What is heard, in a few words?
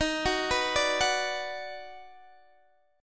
D sharp Minor Major 7th Sharp 5th, Guitar DADGAD